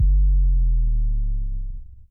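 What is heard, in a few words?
BD Sub, bass drum; Takebeat; Club; VEC1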